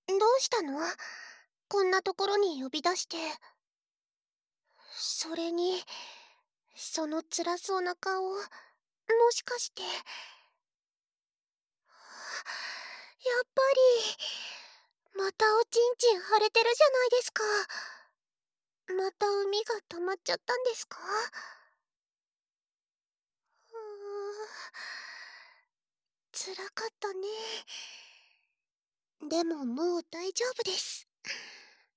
性知識ゼロ耳舐め手コキ♪; まほの性知識ゼロ耳舐め手コキ♪

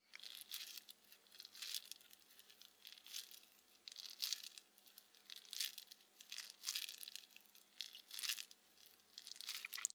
おにぎりを握る音

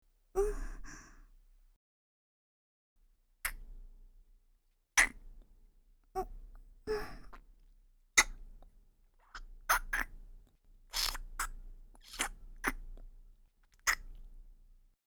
キス (ノーマル キス)

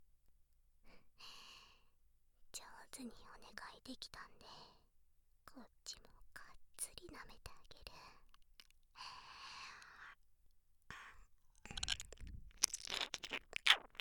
密着ぐっぽり耳舐め, ASMR; おっぱい当て

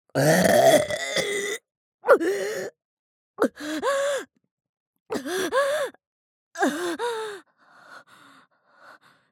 ザーメンゲップ（大）; ザーメンゲップ集

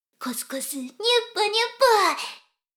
しこしこ音声; 少佐殿; 牧場戦腺

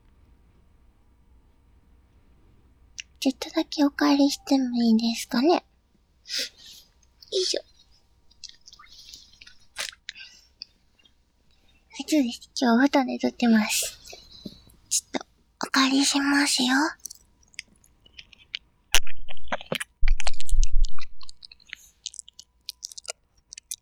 耳舐め

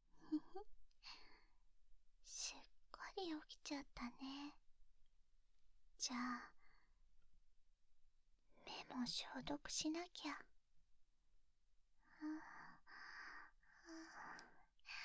眼球舐め, ASMR

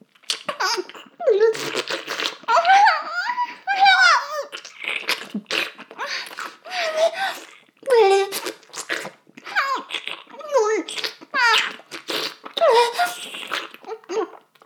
フェラ音声; 牧場戦腺; 少佐殿

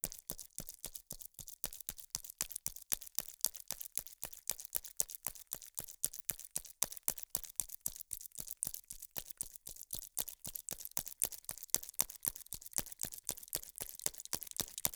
ぱんぱん

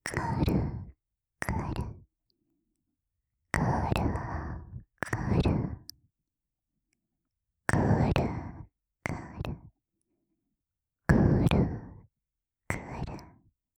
クルクル

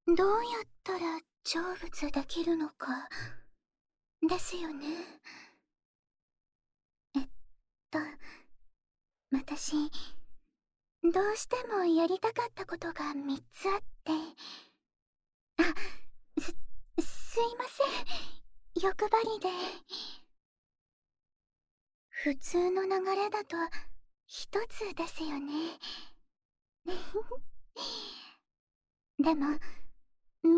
お料理・恋人ごっこASMR; ご飯に…しますか…？

